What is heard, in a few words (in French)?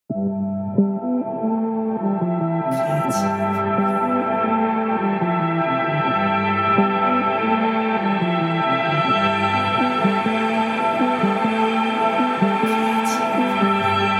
psychédélique